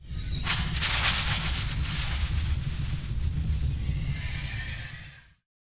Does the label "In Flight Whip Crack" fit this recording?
Yes